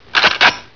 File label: shotgun